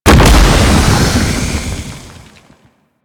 Octopede Unholy Ground Geyser; Warframe; Man In The Wall

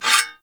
Melee Impact Metal; Warframe; Weapons

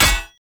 Hammer Impact Shield; Weapon Impacts; Player; Combat; Tuvalkane Hammer

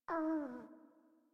Catbrow Kitten Snore; Warframe

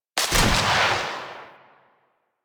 Heavy Grenade Launcher Shot; Warframe; Archwing

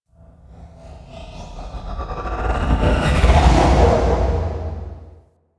Exterior Passby, Ambience; Warframe; Corpus Railjack

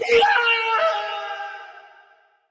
Death Fall Soldier, Battle; Sinecure; en

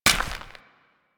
Step Dirt, footsteps

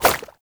Baby Jump; Warframe; Nokko Colony; Visions

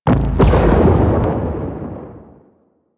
Laser Shot; Warframe; Ambience; Rail Jack; Pluto Charon Region

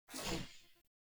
UI Corpus Theme Window Open; DE; Warframe

